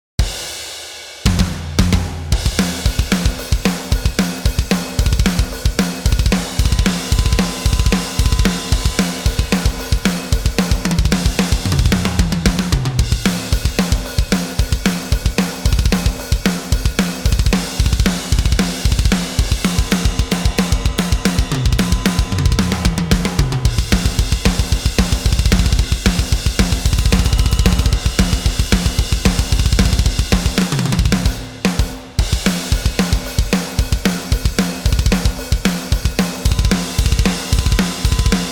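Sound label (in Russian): (только ударные)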